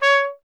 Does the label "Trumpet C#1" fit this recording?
Yes